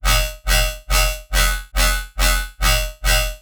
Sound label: weird metalic riddim, bass